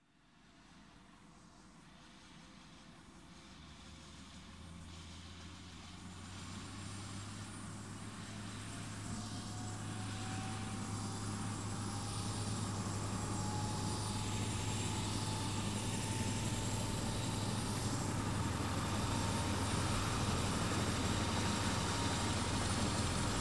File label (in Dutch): Heli Start & vertrekt; KMP Film Video Sound Effects